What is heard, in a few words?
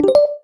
voice app wake start; BlackBerry OS-10; voice app sounds